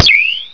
NO DROP; Windows

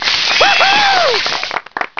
APPLAUSE

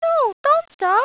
game stop

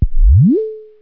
FUNY DROP; toon

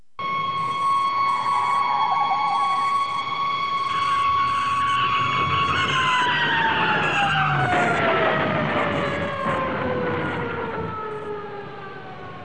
CAR CR

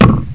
SLAM

